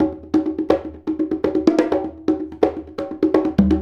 Bonga; Drums; Bongos; Essential Percussion

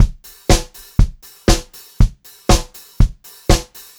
120 pop beat, Pop-Rock Drumloops

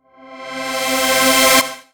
Reverse Synths Cmaj; Effects; Vengeance; Essential Clubsounds Vol.4

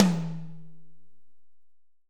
AMBTOM, ROOM AMB TOM; R.AMBTOMH1

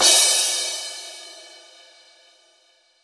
Crash, Cymbals; Roland BK-5

